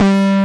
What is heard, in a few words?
BD Distortion, Bassdrums; Vengeance Samples